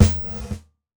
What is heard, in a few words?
Gated Snare; Gated Reverb; Univerb; musicradar